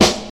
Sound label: good snare single hit a sharp key